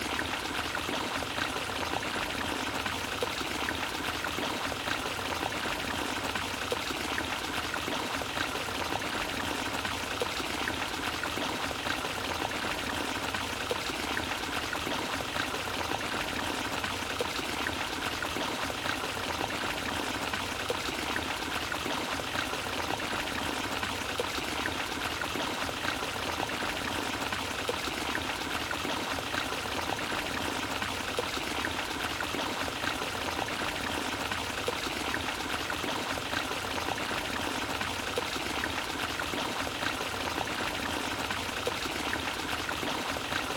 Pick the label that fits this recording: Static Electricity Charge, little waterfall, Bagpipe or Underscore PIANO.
little waterfall